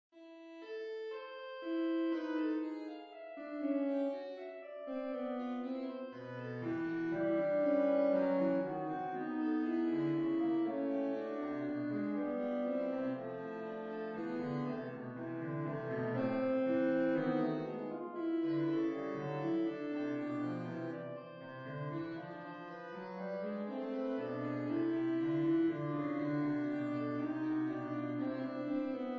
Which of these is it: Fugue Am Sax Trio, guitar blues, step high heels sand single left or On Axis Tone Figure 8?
Fugue Am Sax Trio